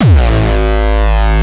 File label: kick; shock. Ultimate Kicks vol. 2